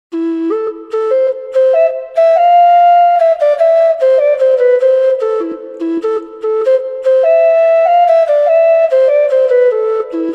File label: melody